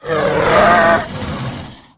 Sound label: Dragon Consort Death